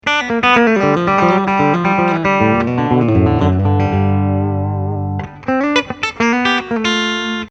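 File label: Normal Country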